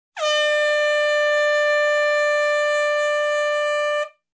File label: Air Horn